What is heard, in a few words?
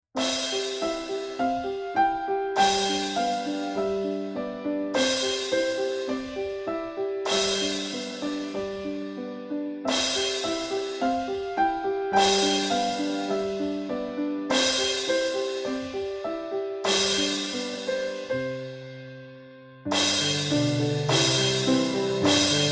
Cymbal